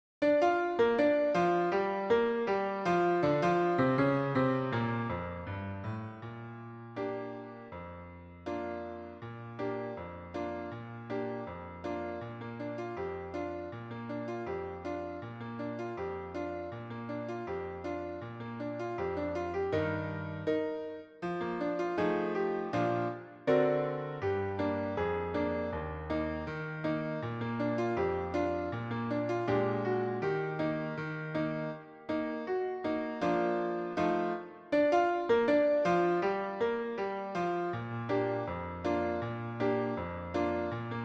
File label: The Gospel Train piano